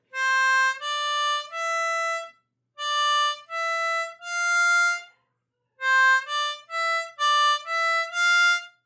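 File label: C Major Triplets Ascending, harmonica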